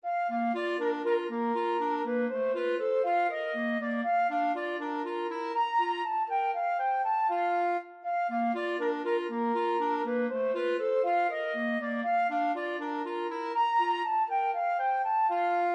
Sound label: flute clarinet duet